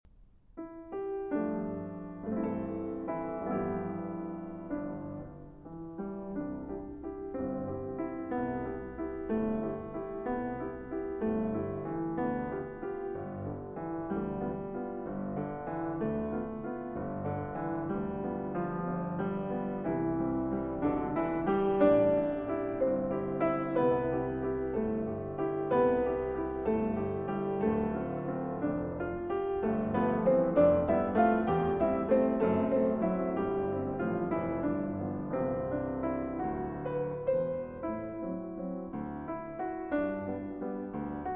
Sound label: Valse Pour Piano; Je te Veux